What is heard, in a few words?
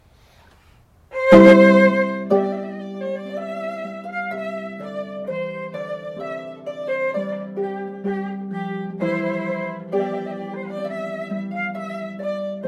harp and violin; Trumpet Voluntary; Great for both processional and recessional; Catherine Boyd